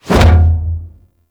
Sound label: Breakout Hit Two; Amalgam Carrus Pilot